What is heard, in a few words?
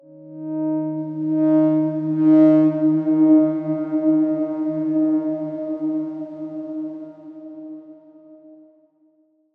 Darkswarm D#3 mf; HSS Ableton Pack1